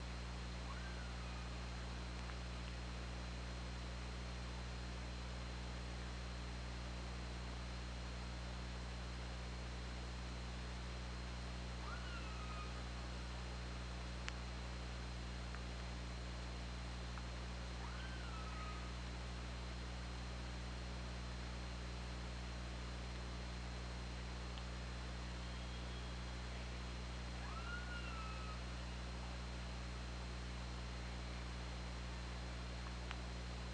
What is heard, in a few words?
OrcaLab